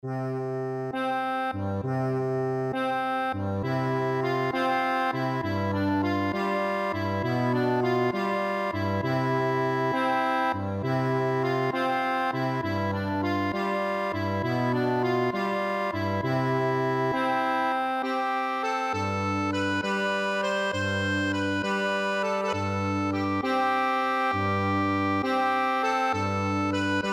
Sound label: barcarole, accordion; offenbach